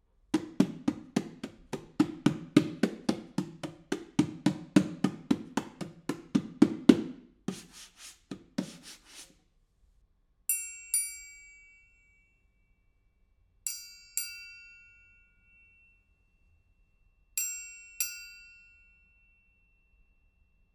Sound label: ORTF PERC; Lewitt